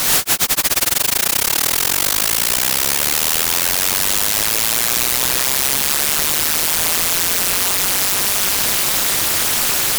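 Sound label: modulated noise varying freq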